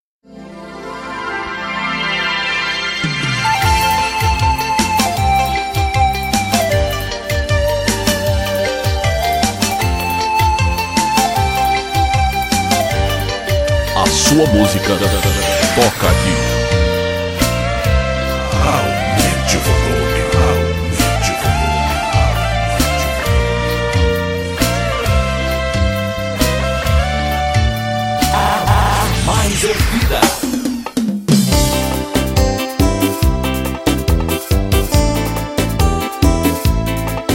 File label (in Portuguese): abertura